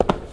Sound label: player step